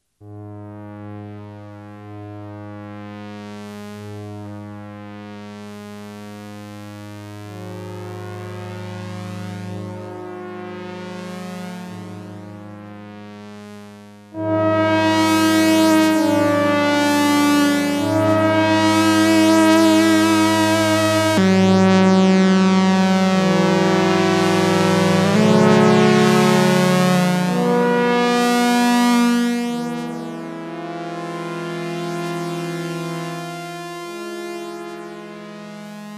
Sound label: 15edo